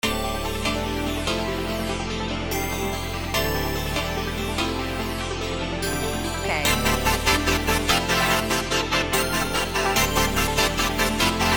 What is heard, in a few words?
beat